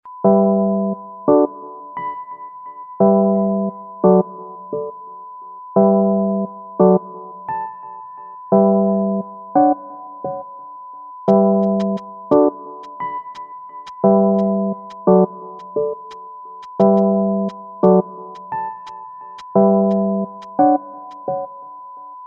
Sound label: air groove